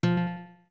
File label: Notes Guitar F short; Zora guitar (short)